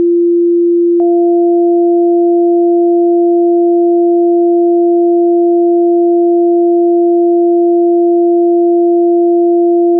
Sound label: sine